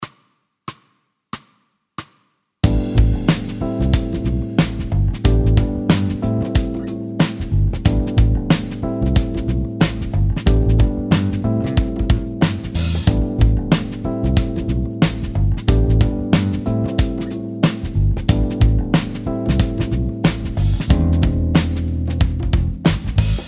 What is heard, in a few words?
smooth groove